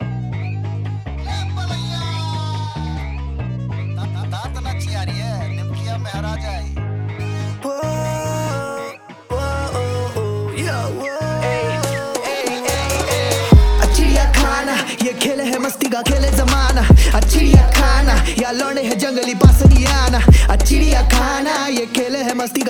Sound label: rap